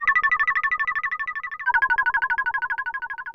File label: bend bell